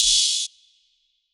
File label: Open Hats